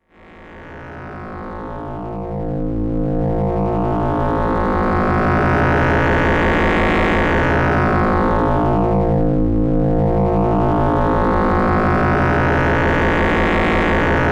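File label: warps cross folding ext, synth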